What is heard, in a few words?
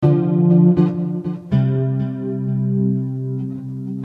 Bass Amb Chords D, C 119